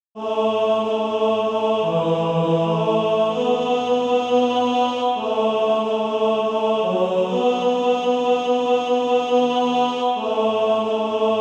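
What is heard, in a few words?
tenor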